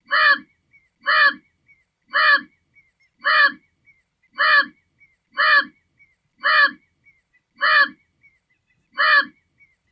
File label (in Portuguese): Canto da Arara Azul de Lear